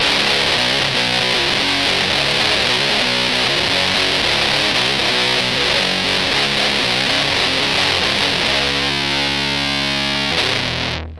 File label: tone max stock bigmuff; tonestack bigmuff